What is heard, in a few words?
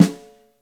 60S SNARE; InVision Interactive; Lightware VOL-1